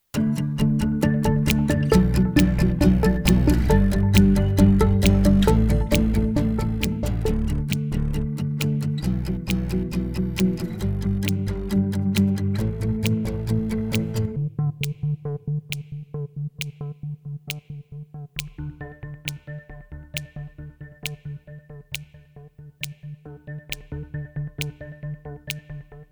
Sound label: Electro Acoustic Pop Suspense